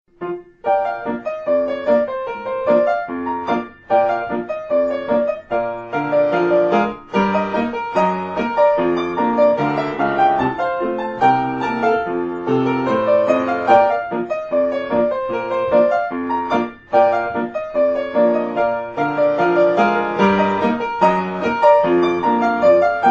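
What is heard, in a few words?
pineapple rag piano, ringtone; blues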